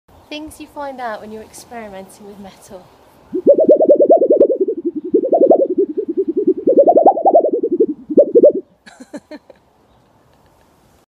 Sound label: wobble